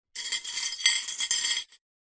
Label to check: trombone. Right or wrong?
Wrong